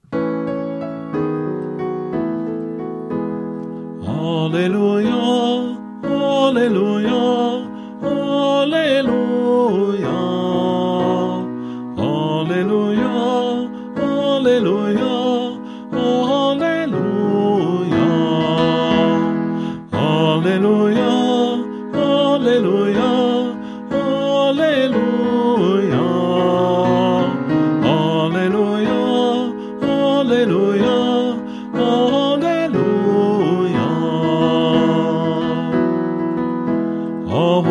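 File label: Alleluia, Choir Practice Tracks; Christmas Day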